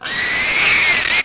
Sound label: moan